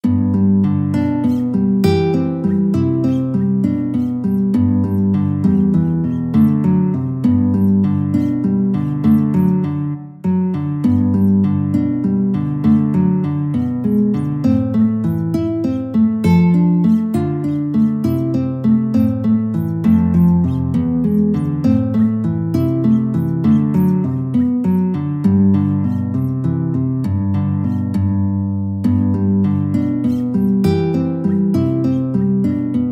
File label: cradle, guitar